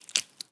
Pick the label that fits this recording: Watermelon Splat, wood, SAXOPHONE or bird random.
Watermelon Splat